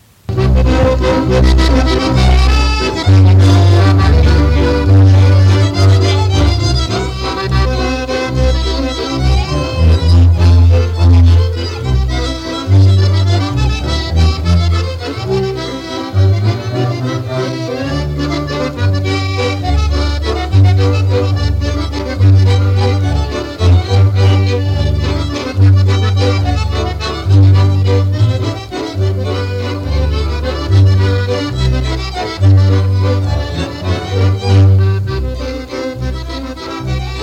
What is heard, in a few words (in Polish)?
Instrumentalny